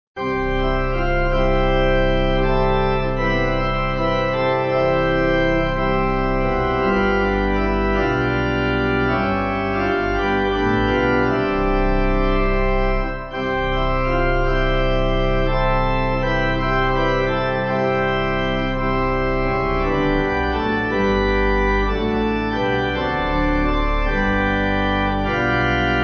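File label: Pipe Organ, Music Backing Files for Churches; One Day When Heaven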